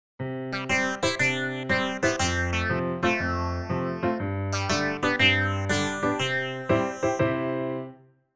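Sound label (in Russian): легкий смешной веселый ритм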